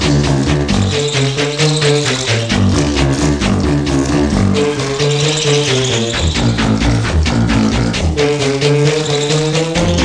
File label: Music Loop; 300 games for mac; Arcade; Apeiron